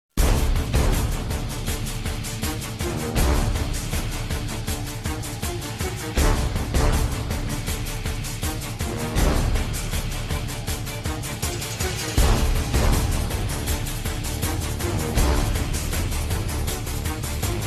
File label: soft sound